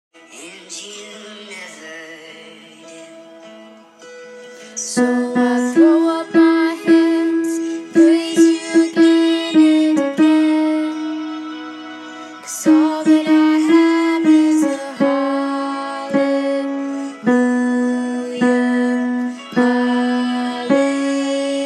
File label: Chorus Alto Harmony; ALA Chapel Team